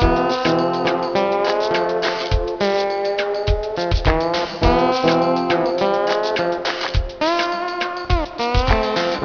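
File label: country; Audio Images Interloops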